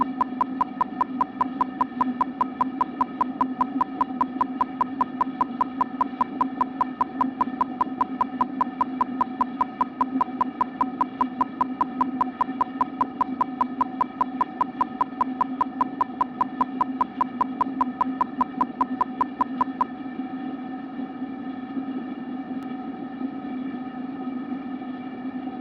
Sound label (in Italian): burst 16ms; LaSpezia; Da Sorg1 a Mic2; CESI